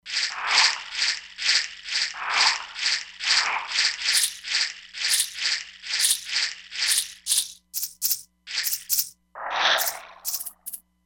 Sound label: Stereo Shake, E-mu Proteus-3; Factory patches